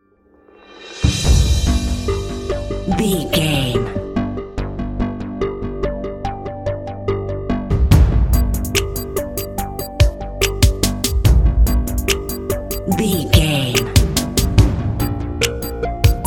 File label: dramatic decision countdown